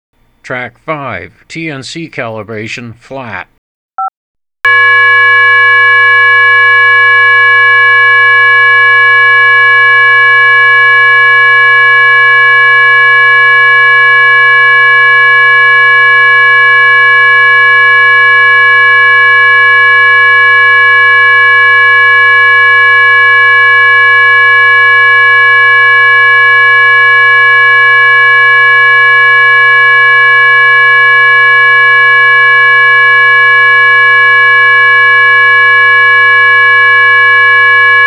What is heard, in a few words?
kpc3 plus cal tones flat